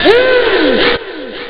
ooohhh, speech; OctaMED SoundStudio V1

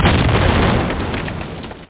Explosio; Angst; Amiga

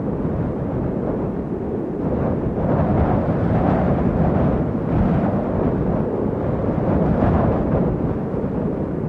Wind Hill; 1944 Battle Of The Bulge

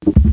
Beep; Paul

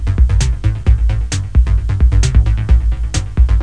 electro; Guitar&Electro

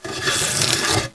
plane; KABOOM! - Wild and Wacky Sound Software

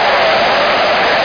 ski; NewSurfs

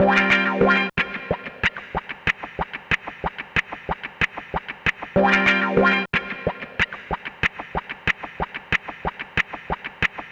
guit; GUITAR; 96 BPM

